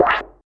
steel snare drum f key, snare sound tuned to the F note. Loudest frequency: 1074Hz; snare drum samples